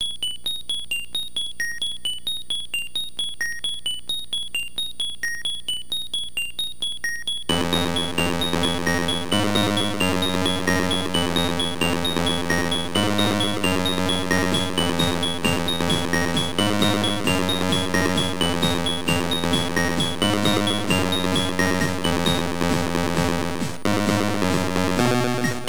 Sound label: dance horror